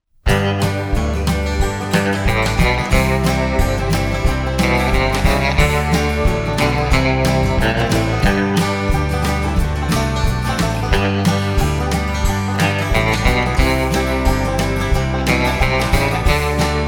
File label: country